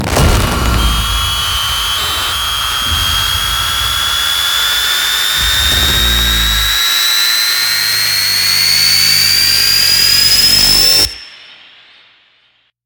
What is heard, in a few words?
Stab; Death Head